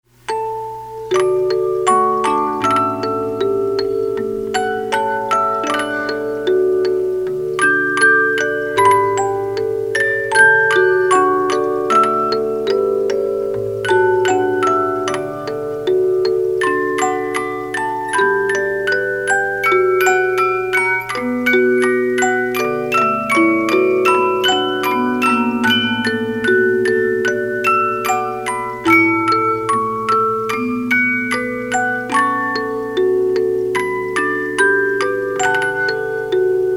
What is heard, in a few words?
50-Note Movements; Etude Op 10 No 3 Chansom De L adieu